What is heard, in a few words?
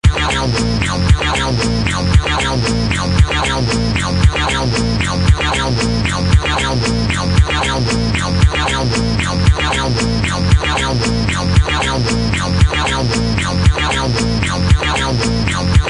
loop